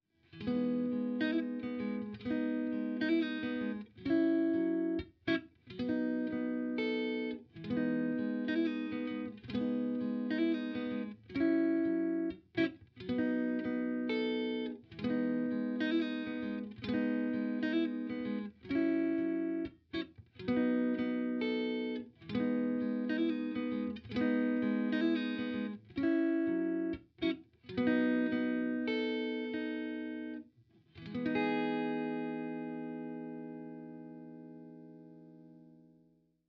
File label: white clean front